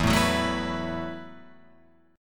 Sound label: E Suspended 2nd Suspended 4th E, Guitar, Open F; strummed; JGuitar